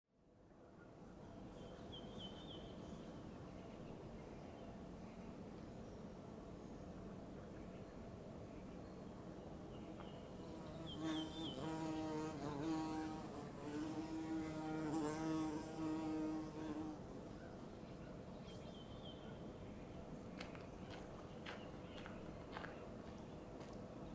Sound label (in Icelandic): geitungur og göngugarpur